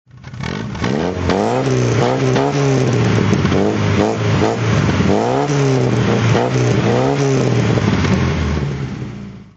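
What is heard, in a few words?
volvo pv544 rally throttle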